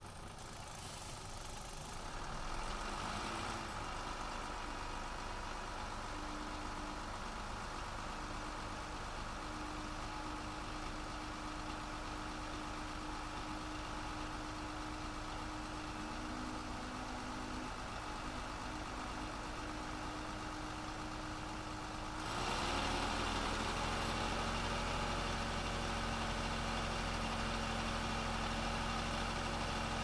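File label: patria 6x6 onboard steady rpms drive stop engine; dpa4062; Sonniss GDC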